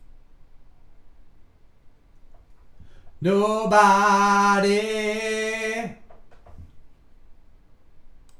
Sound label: vocals